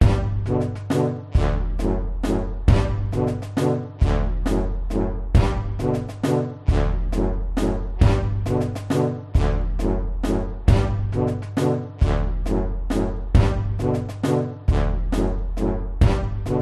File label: cartoon marching walking heavy elephant musical military